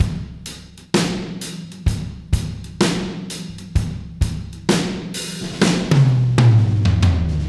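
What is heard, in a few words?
Beat Loops, 64 bpm; Buffalo; Zero-G - Monster Beats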